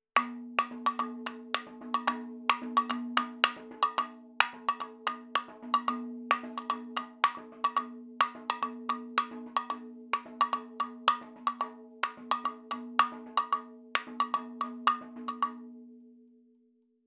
110 7 8 MADALAM, percussion

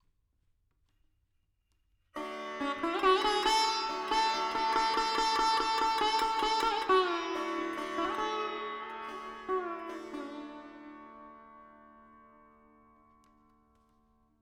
Veena D 70 Verb; Sample Banks; Melody; 4-4

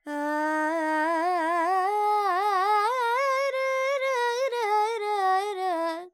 Charukesi, Vocals, Carnatic Female, Alaps, G, Dry; Sample Banks

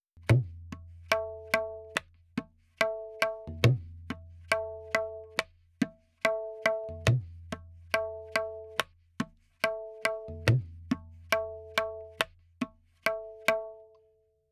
Tabla, 4-4, 70; Sample Banks; Percussion

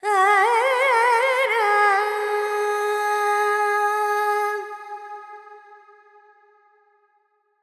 FX Lydian, Vocals, Carnatic Female, Alaps; Sample Banks

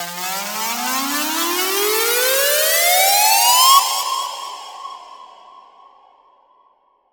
FX Uplifter; Sample Banks; Percussion

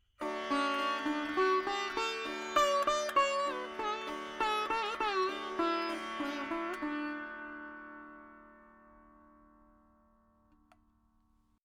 4 4 D 100 Dry, Melody Veena; Sample Banks

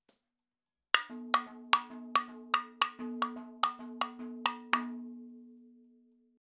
110 7 8 MADALAM FILLS, percussion

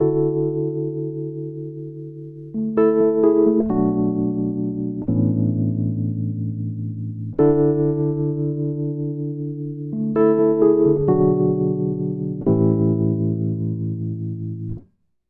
Wurli Freestyle A, Keyboards; Sample Banks; Melody

